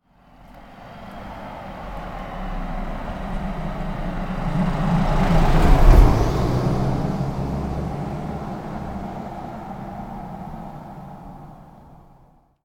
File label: car pass by